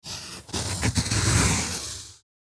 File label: Pencil writing a single line